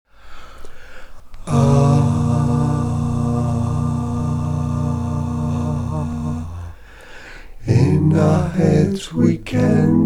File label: Piece For Four Acapella Voices